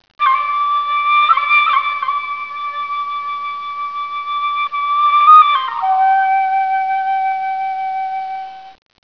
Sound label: flute